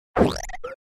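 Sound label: Brake Electric Beep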